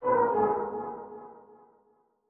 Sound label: ethno, 125, A